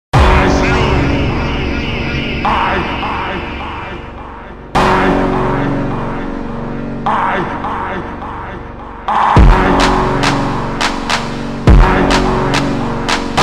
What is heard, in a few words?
slowed reverb